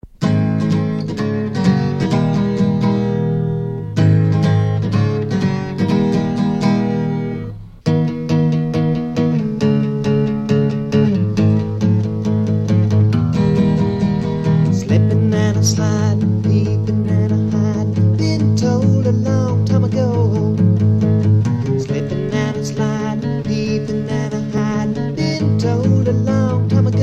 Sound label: acoustic